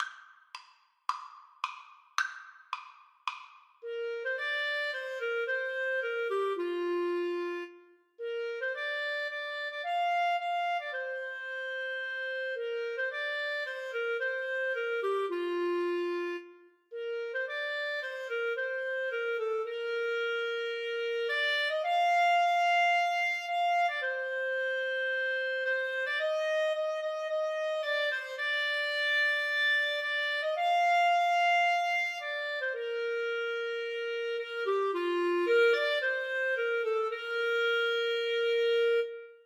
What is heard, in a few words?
in the sweet by and by Clarinet duet